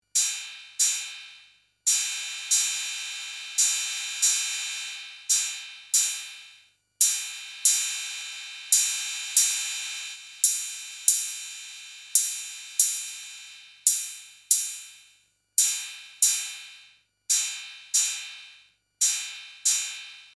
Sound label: Cymbal